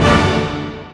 Orch Hit, Korg M1

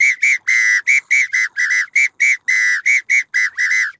PITO, DRUMS SAMBA; Best Service ProSamples vol.14; AKAI

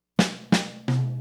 Quiet Storm Drums Intro, 70s Analog Funk; Sonic Foundry (Sony Creative Software); What it Is! 70s Analog Funk

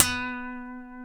C#, funk guitars; Spectrasonics; Hans Zimmer Guitars VOL-1; TAKA FUNK

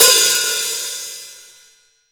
K-ZIL HHO, 14INCH K-ZIL HI HAT

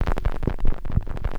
VInyl Noise; Houseworx

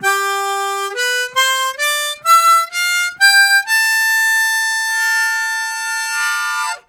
Octave Steps, Harmonica; Zero G

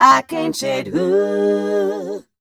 f mel e flat, fem Vocals

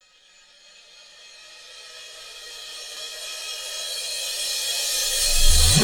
Reverse Space; Electronic Point Blank

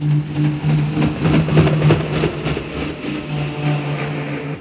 Ambient FX, Ambience FX

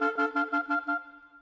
Clarinet Oboe, Orchestral; Sonic Foundry (Sony Creative Software); Orchestral VOL-2; Modern 09

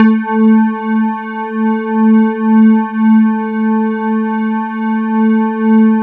E-HARP, DX HARP; Wizoo - Powered DX

